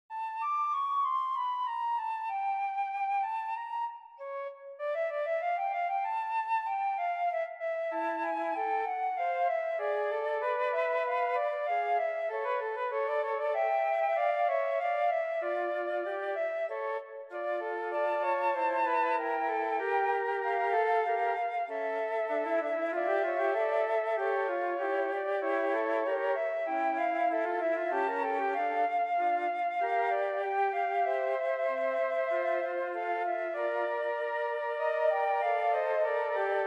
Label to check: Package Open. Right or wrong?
Wrong